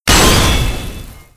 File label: weapon land